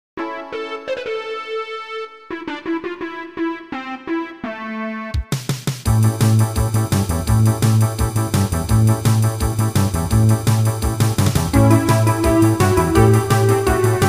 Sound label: instrumenta